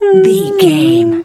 bear pain whimper

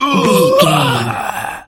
voice male death

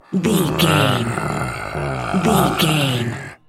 zombie voice groan

